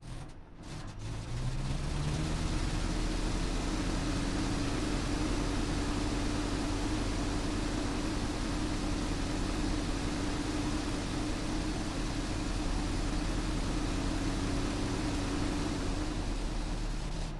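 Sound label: fokker dr1 onboard taxi in front of pilot; mkh8020; Sonniss GDC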